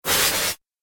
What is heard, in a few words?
Laser Energy Charge; Laser Gun Sound Effects